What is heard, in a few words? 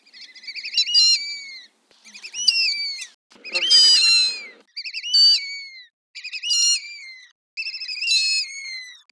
Dendrocygna arborea males